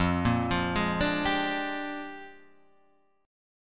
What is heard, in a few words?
Bb 13th F